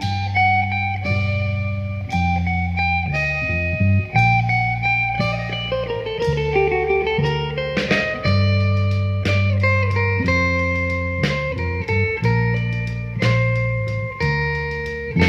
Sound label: guitar rock; Loops